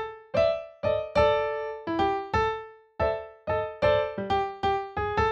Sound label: Piano Blues vamp